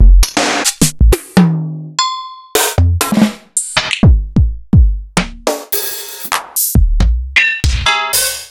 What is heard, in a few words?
EMU Proteus-2000